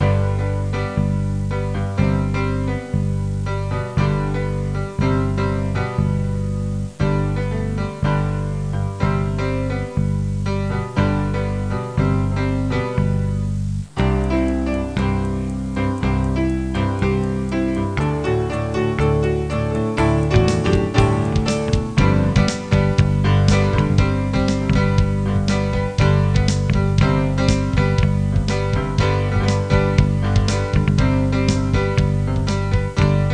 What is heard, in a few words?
New Theme; Bible Fun Pack; Education Publishing Concepts; NOAH